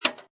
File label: Pinball Sound Flipper; Video Game Sound Effects; Video Games (Sound Effects:Games); Lynne Music